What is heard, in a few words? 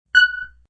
Bleep; Beeps